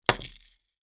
Pill box on table sound

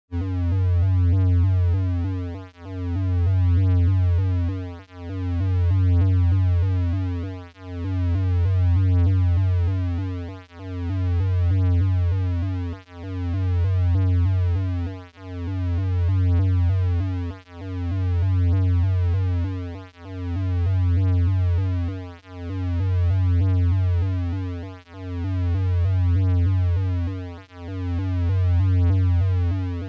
Deep Pulsating Synth

